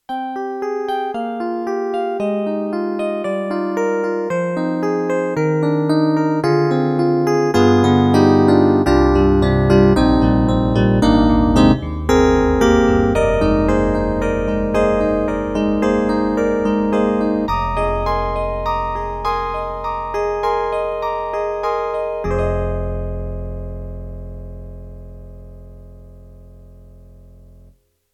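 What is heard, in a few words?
YAMAHA MU1000EX, Electric Piano 2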